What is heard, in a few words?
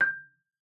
MARP G6, MARIMBA; Xsample VOL-4